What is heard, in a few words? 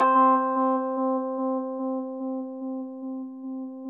Chor Rhodes, C; E-MU Producer Series Vol. 5 – 3-D Audio Collection; 3DRhodes; 3DChorRhodes1.5